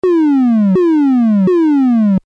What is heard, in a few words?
lfo saw; 下降ノコギリ; nord3